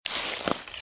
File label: Footsteps - Bush walk - Right; Eden; RadwinIsland